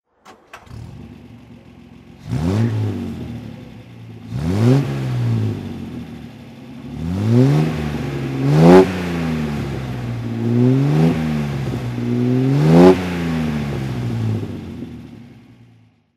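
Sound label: austin healey 3000 mkI throttle, engine sound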